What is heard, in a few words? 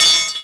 crowbar